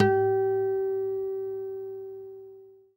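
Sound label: harmonic, Guitar; Quantum Leap; Guitar & Bass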